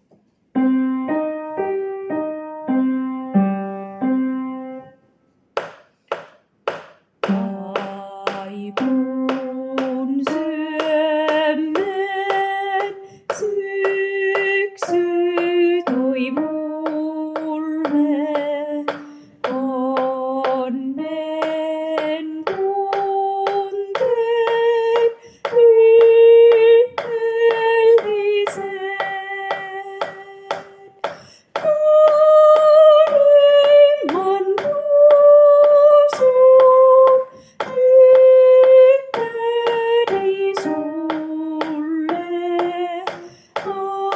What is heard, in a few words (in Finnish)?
Syysunelmia, sopraano